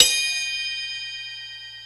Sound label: Bell One-Shot, cymbal; KJ Sawka; Drum n Bass & Beyond; Drum Tool Box